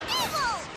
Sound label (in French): cri; emolga